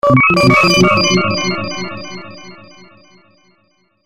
New Message Tone

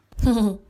Cute Noise

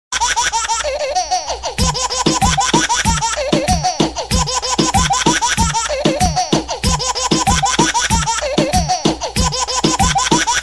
Most Funny Baby

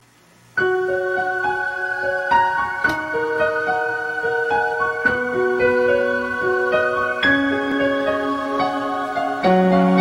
Soft Love Tone

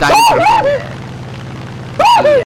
Three Round Burst

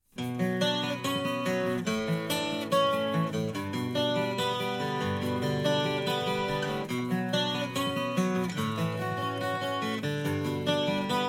Acoustic

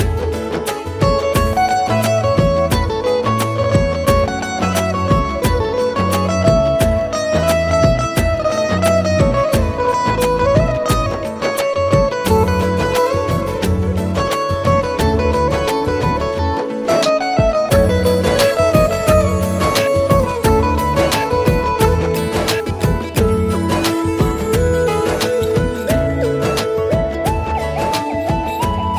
Gtr

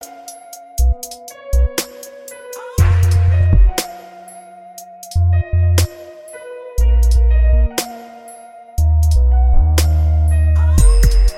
Beats

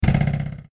arrow

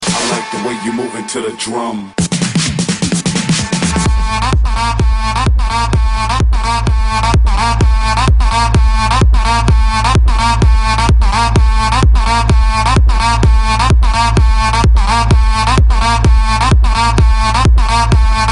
Insane Beats

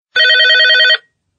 Office Phone

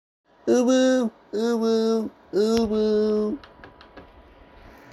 Hoo woo

